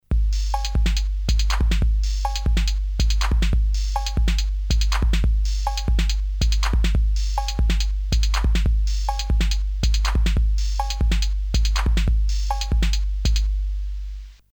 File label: 808 no filtered; commodore amiga